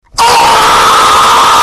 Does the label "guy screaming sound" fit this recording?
Yes